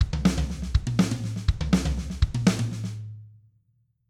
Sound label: Triplet Fill, drum